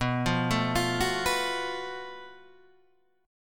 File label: Gb Minor Major 7th Double Flat 5th B